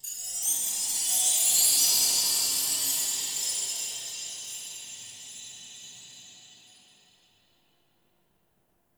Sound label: Magical Chimes, Root Cave; Ambience; Neathuns Root Cave; One Offs